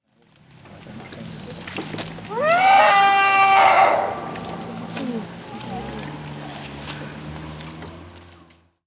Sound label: asian elephant elephas maximus; slona